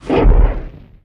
Flame Thrower Shot End; Weapons

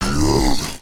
Hit; Blind Quest - The Frost Demon; Kyllius

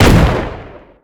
Shotgun Shot, Weapons

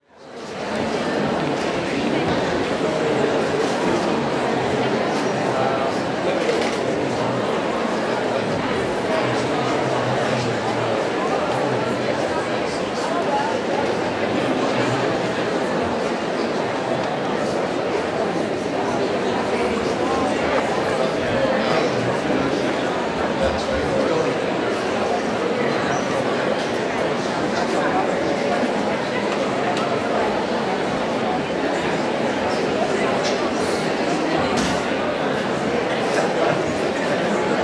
Crowd Indoor Large Hall, Walla

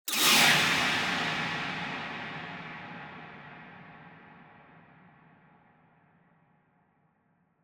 Impact Metallic Thin Dissonant Impact; Hollywood Edge; sound designer's toolkit v2

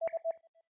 OLD ONLINE; skype

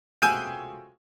Comedy Piano Chord Surprise, Single Hit; Hollywood Edge; sound designer's toolkit v2

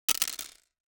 DICE on Hard Wood, Throw and Roll, Mini, Six Dice; Samples; ArticulatedSounds; DICE Sfx Party (Extended)

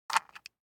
DICE on Wooden Tray, Grab, Standard, 4 Four Dice; Samples; ArticulatedSounds; DICE Sfx Party (Extended)

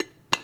Candle Insert Metal Holder; SFX Kit; Foley - Misc

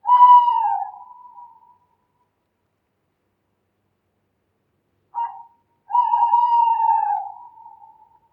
owl; DeadFright

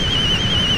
Rocket Missile Loop; SFX Kit; Weapons

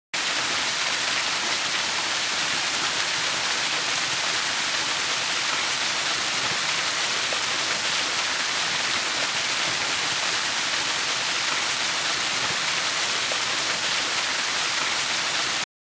water stream medium

